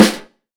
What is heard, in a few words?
phat steel snare drum sample g sharp key, Royality free steel snare drum tuned to the G# note